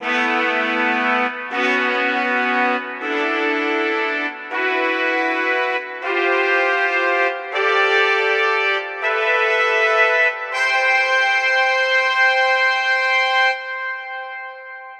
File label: Trumpet Phrase Fade In